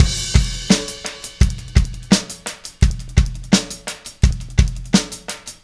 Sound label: Static Beat Crash; Sonic Foundry (Sony Creative Software); Indie Rock Anthems; Session 13 - Static